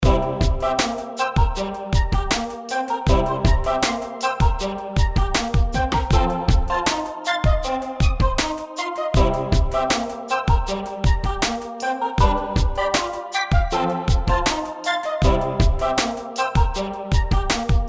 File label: Quirky Choir